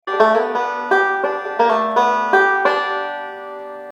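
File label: lick 3-2 pull-off variation, banjo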